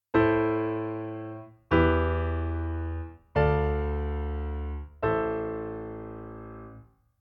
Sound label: kadenz; chord functions